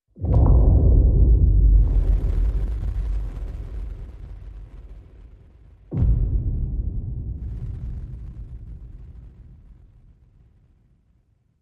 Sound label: Synth Bass Harp and SFX Only